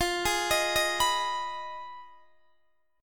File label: F Minor 7th Flat 5th, Guitar, DADGAD